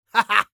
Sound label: laugh short; Demoman